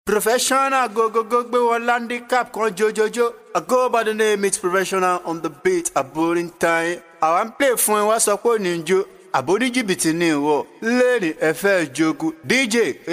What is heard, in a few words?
Dj Jingle